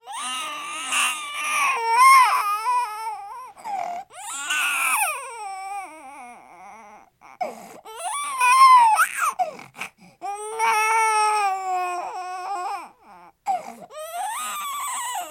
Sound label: Human Baby